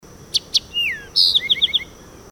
Eastern Towhee; Sony ICDUX523 w Parabola

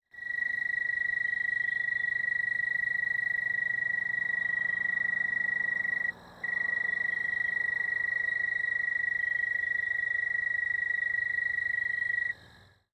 Broad-winged Tree Cricket

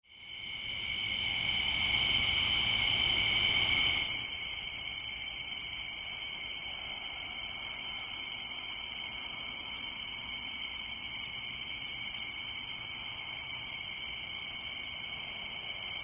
Round-tipped Conehead

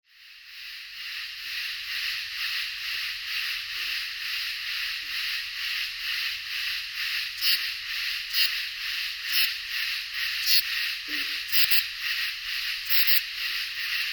Katydids

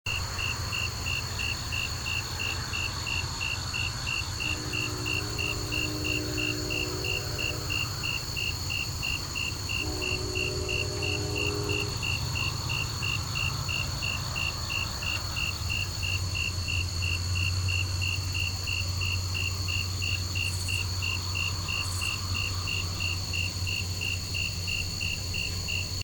Snowy Tree Cricket

